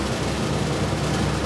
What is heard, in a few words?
Ui Cooking Alert Score Tally Loop; gamerip; Battle Chef Brigade